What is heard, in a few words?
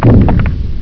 momma step